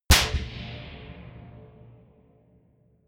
gun slapping pop gun

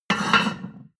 plate on plate place slide hard

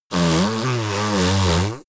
yeti nose blow strong

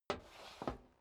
step boots metal dry scuff; schritte

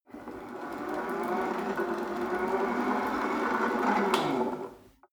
rope trainer pull sequence slow, fitnessstudio

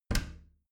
bathroom cabinet warped close regular; objekte; tueren

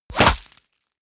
bullet small impact body flesh; krieg-waffen; geschoss

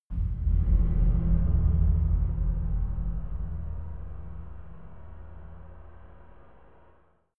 scary metal chime

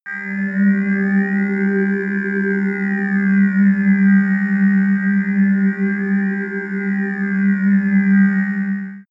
sci-fi ambient scanner medium, drones

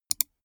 gaming mouse left click single fast soft; maschinen; buero

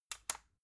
magnetic plug; objekte

